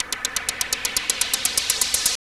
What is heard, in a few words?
NOISE; East West